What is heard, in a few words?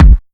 smooth bottom end kick drum one shot g key